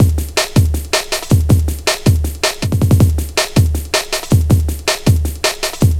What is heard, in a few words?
160, Drum Loops; Total Drum&Bass